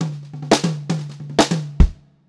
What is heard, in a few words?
fill, pop-rock drumloops; USB Soundscan vol.37; AKAI